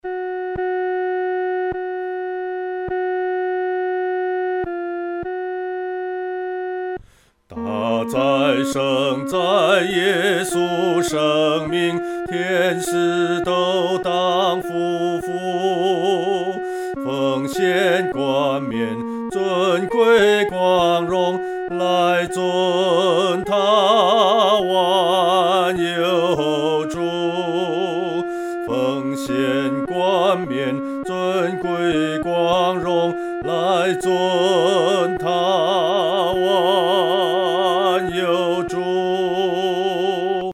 独唱 第二声; 大哉圣哉

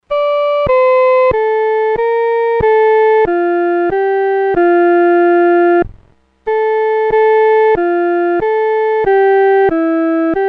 独奏（第一声）

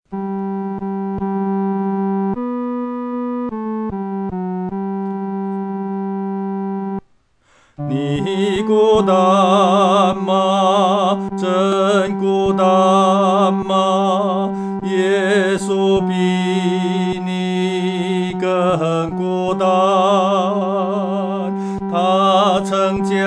独唱 第三声

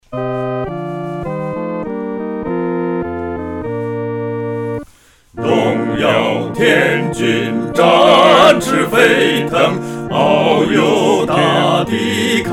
合唱 四声部; 荣耀天军

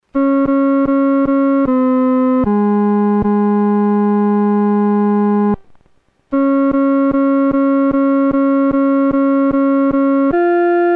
独奏 第二声

